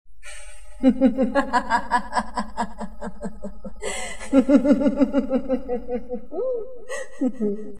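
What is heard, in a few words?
evil female laughter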